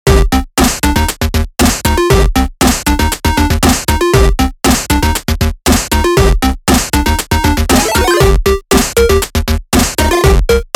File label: pixel fight 8 bit arcade music background music; white records